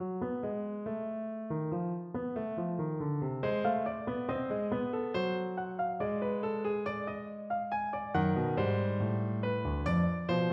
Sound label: fugue for piano